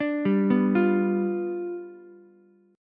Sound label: G 7th G down Ukulele; G7 arpeggiated; JGuitar